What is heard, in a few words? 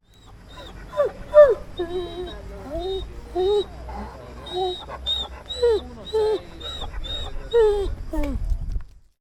piango tango